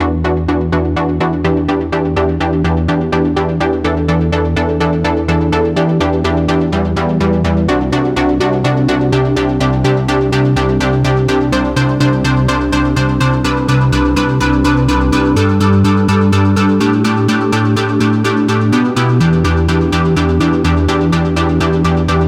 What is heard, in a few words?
Classic Flange Pluck